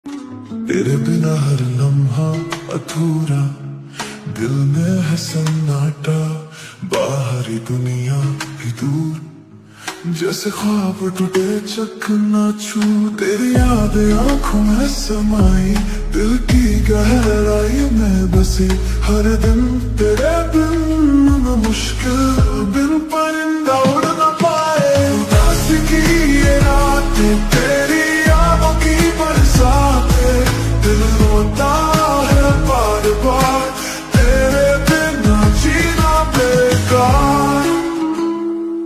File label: lofi ringtone, hindi ringtone, ringtone song, sad ringtone